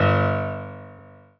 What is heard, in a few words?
piano